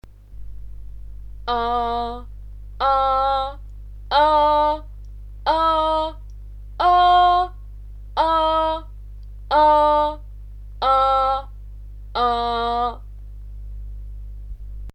Klare Stimme Harter Stimmeinsatz

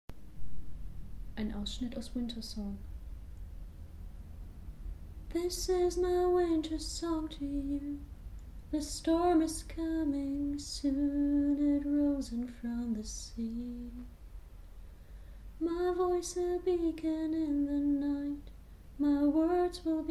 Kopfstimme finden, Einen persoenlichen Song singen

